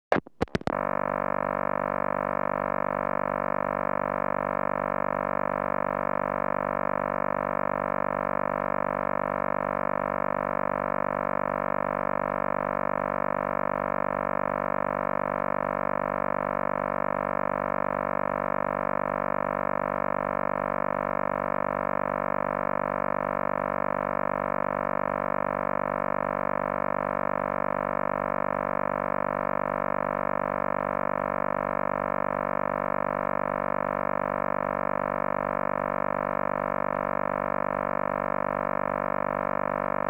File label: BT Lines AND Tones, Caller Rin; Computers Printers Phones; BBC Sound Effects Library